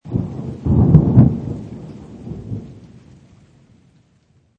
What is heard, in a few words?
Thunder, Thunder crack; Thunder Sound Effects; Weather; Lynne Music